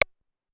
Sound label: Rim, Pitched Up; Clavia DDrum-AT